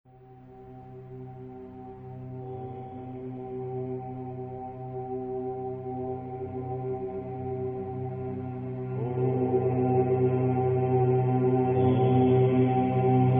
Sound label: zvuk OM; Iznachalnyiy